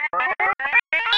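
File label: sphere blips large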